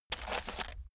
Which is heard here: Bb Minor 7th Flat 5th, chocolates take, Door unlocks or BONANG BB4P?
chocolates take